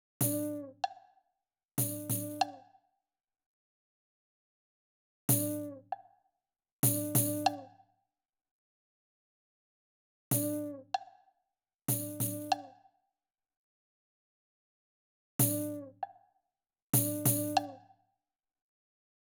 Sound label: perc